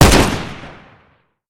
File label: rifle fire, gunfire; weapons; rifle desert